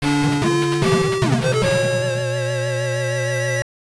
Kombat Tomb - Round Over; Mortal Kombat II; master system

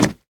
Concrete Jump

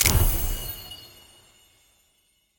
UI Menu Skill Learn

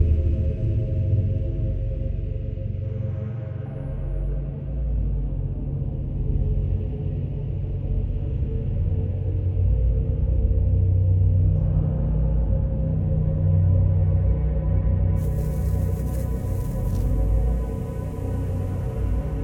Menu Loop; Solus Project

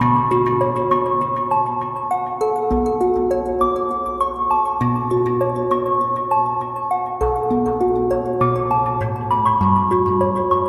intro loop 100bpm

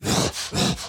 Enraged Breathing Loop; The Town

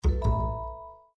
Battery Critical; Cityscape

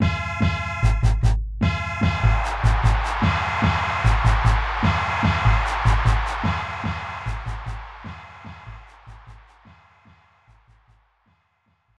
Goal; J League Soccer Prime Goal 3; SNES

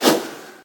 Dodge cold

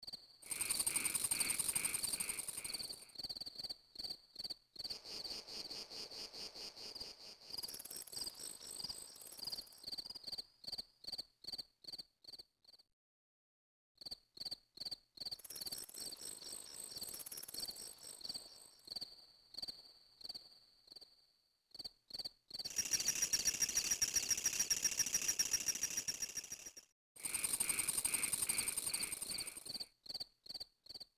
Chirping Crickets; game rip; lunar silver star story complete

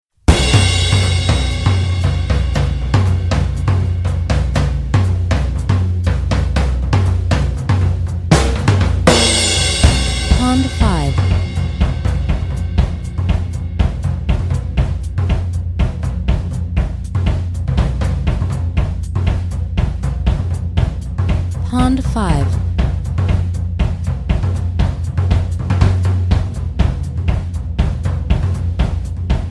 Drums Swing Driving Bada Boom

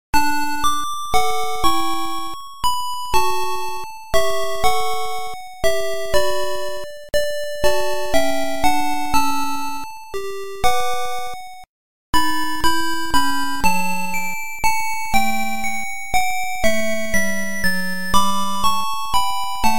Little Bo-Peep - Song; Mixed-Up Mother Goose

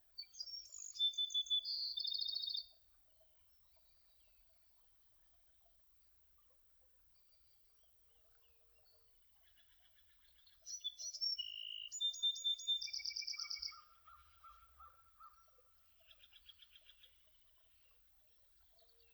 birds loop

